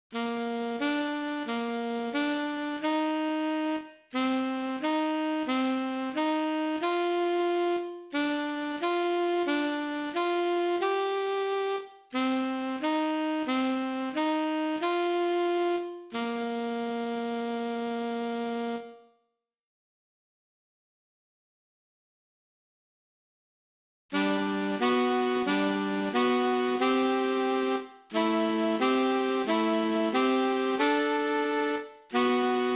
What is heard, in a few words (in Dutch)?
I III IV II IV V III V VI